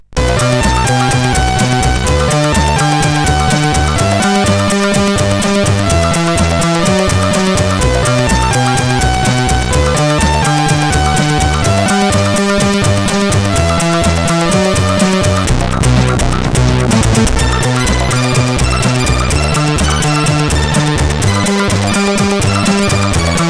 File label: Intro Music sid CSG8580R5; X-Ample